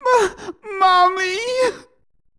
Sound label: death; uub